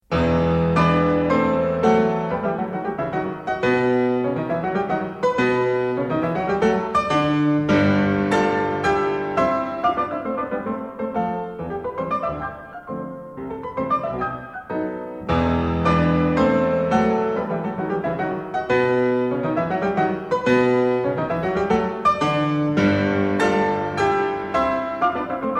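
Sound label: Brahms Piano Sonata third movement; Brahms Piano Sonata 1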